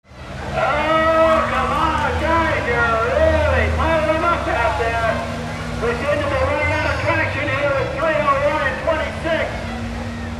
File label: CARS